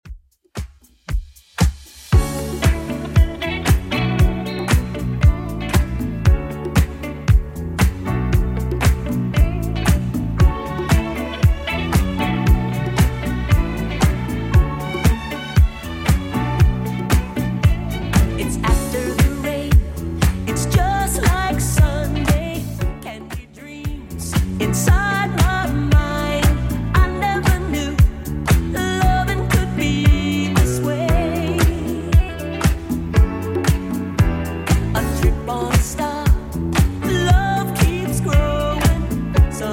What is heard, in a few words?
80s Redrum 116bpm